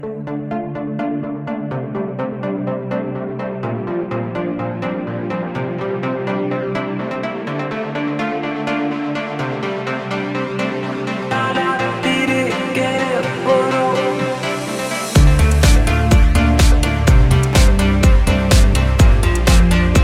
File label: Disco